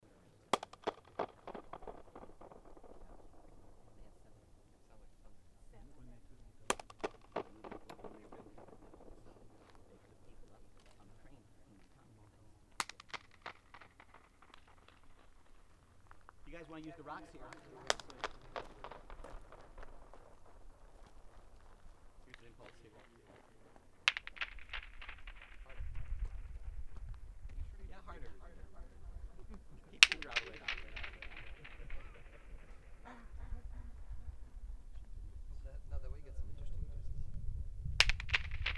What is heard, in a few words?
cooling tower handclaps rocks